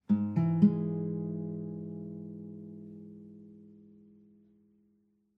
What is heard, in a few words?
CHORD